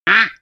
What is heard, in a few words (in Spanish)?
Fallo fail